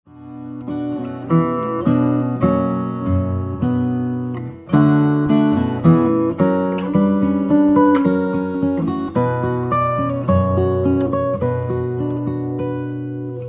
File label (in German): Instrumental